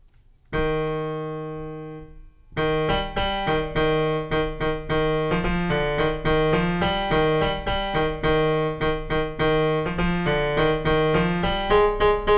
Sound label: bari only